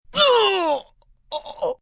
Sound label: Pain Stabbed, Pain, pain, short, impact, vomit, agony, moan (human voice)